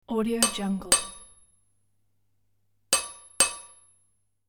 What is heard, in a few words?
hammer hits on anvil; industrial sounds; ضربه چکش به سندان